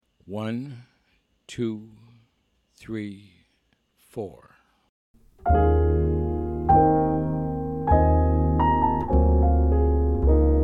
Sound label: minus Drums